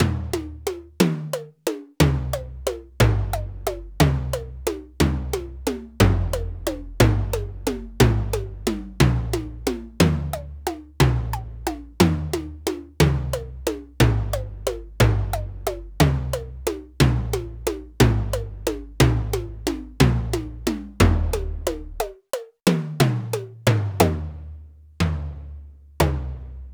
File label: melodic tom